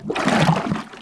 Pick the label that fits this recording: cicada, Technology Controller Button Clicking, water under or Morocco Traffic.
water under